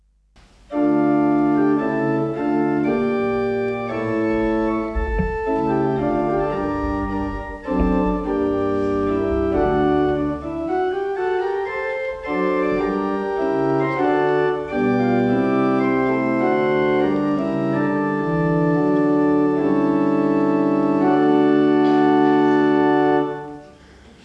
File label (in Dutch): octaaf 4, orgel; assen